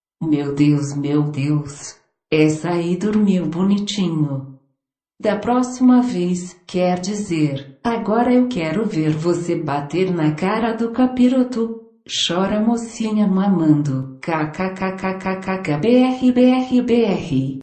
hahaha

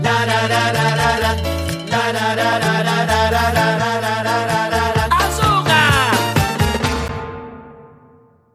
salsa

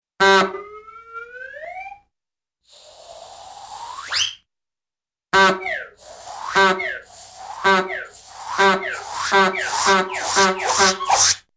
multiple honks suctions and whistles

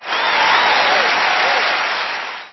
loud crowd cheering

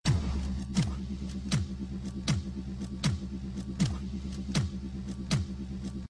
thumping; robocop

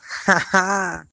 laughter

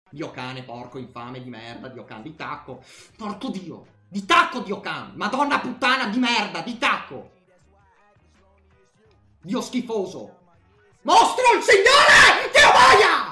ave maria remix

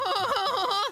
huhuhuhuh

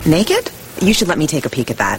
ringtone

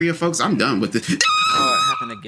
mean scream

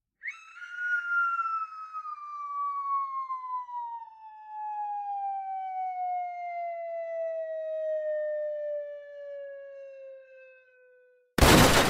falling sound

